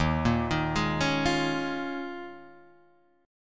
Eb 9th Suspended 4th Eb, Guitar Open D; down

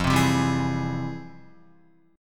F Minor Major 9th, Guitar, chord; FmM9 strummed; JGuitar

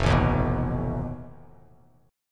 F 7th Flat 5th F Bass Standard; strummed; chord; F7b5; JGuitar

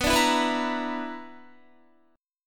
B-Minor Major 7th Double Flat 5th B down Guitar; BmM7bb5 strummed

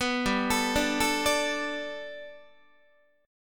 G Major Add 9th Guitar, chord; Gadd9 arpeggiated; JGuitar

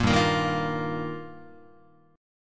G Minor 7th Sharp 5th, Guitar; Gm7#5; strummed; JGuitar; 1,3,1,1,2,4

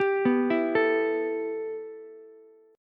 B 7th Suspended 4th Sharp 5th, Ukulele; B7sus4#5; arpeggiated; JGuitar

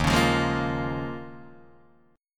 D sharp 9th Suspended 4th D sharp, Guitar DADGAD

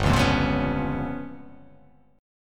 G 7th Sharp 9th Flat 5th

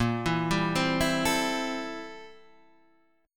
A Minor 7th Bb, Am7/Bb chord; Am7/Bb arpeggiated; JGuitar

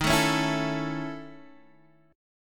A Major D sharp; strummed; JGuitar

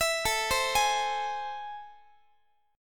A Minor Major 7th, down, Guitar; arpeggiated; AmM7; x,x,19,19,16,19; JGuitar

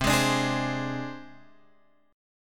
C Suspended 2nd Sharp 5th C down Guitar Drop D

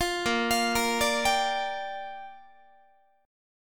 A sharp 6th, guitar chord; A#6; arpeggiated; 20,18,20,20,18,18

